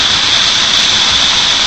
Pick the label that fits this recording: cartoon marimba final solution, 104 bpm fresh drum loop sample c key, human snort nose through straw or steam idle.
steam idle